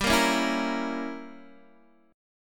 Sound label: G Diminished 7th, Guitar, Open D